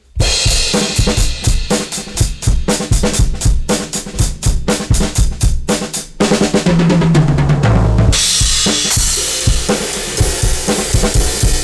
drums; ER DRUMS 2

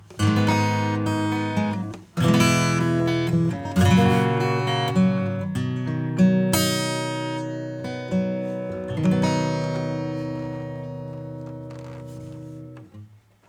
Harmonics acoustic

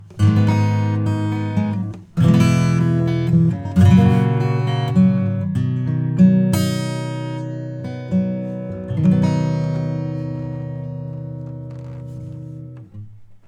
acoustic; MASSIVE

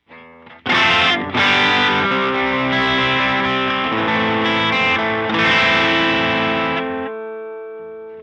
guitar; smart EQ 3

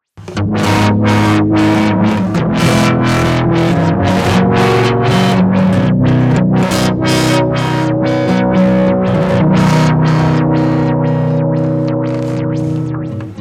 acoustic; Zen Delay; Double Take

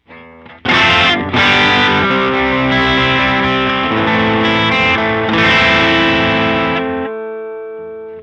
electric guitar; Joe Chiccarelli

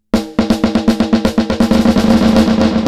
Delay ANALOG; Cluster

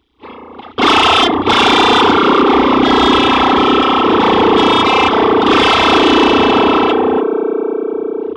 LFO; Moogerfooger MF-103S

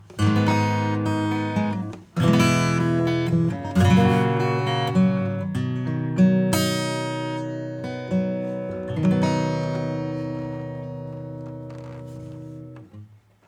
Acoustic

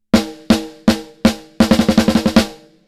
snare SNARE LIVE ROCK; MIXROOM

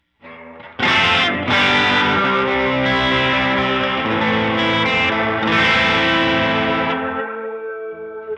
electric; Cluster Delay; preset LESLIED

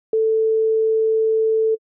sine wave; Pigments

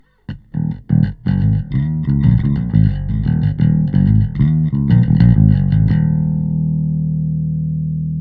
Bass; Gem Comp LA

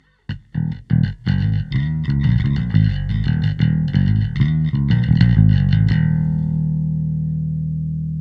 Bass Modern Clean Electric Bass; Neutron 4

